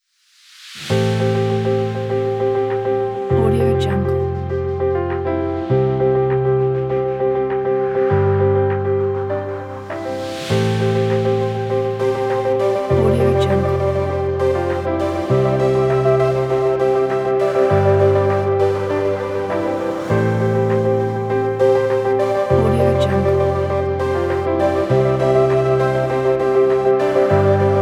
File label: corporate